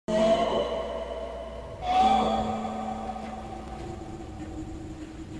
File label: sounds of hell